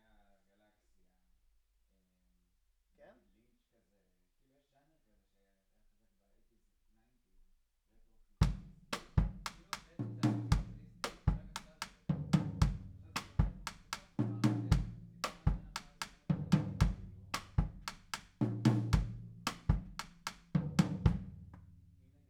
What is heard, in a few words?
Room Parra